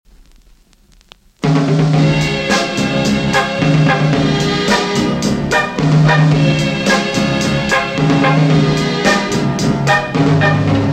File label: LAUGH